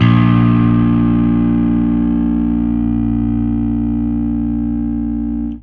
Fuzz A sharp 5

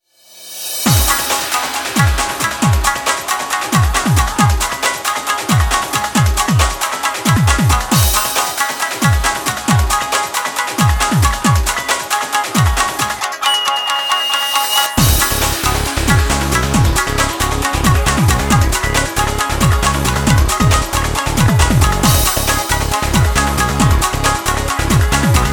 Crashing Waves; sengoku musou 2 original soundtrack complete 2013

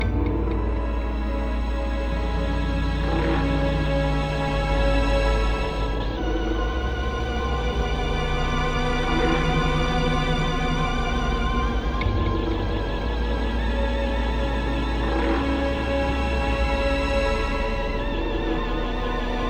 Steelworks Slow; rive wreck hack die retry; ps4

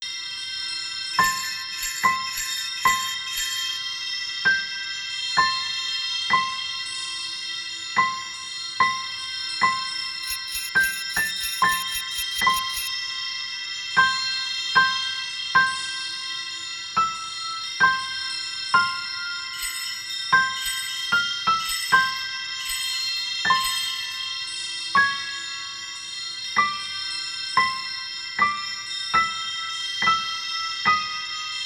Snow Ambiance; Austin's Candy Quest

